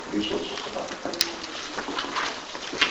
scream unsure; Waverly Hills; EVPS; Spirit Chasers of the Unknown

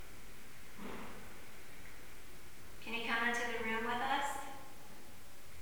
bang no acknowledgement then speaks; Old 6th Detroit Precinct

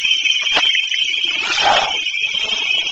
Unknown breathe; St Joseph Cemetery; Spirit Chasers of the Unknown; EVPS

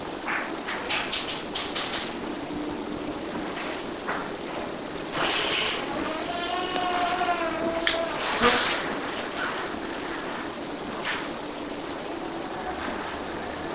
Screen Door Opening possibly; Perry Schuch Hotel Saginaw, Mi; EVP

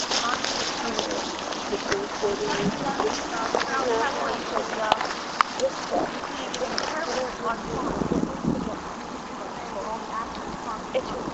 possible gun shots or cannon in distance; Gettysburg Pa Culps Hill

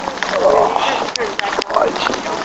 Soldier saying ah choo; Gettysburg Pa Culps Hill

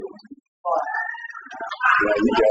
Unknown scream; no response; Waverly Hills; EVPS; Spirit Chasers of the Unknown

